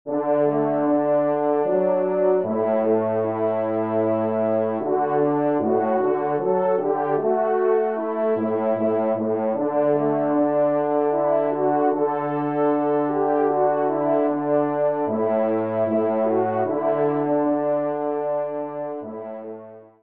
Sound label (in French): romance, trompe; Loisirs du chasseur 11; Pupitre 3° Cor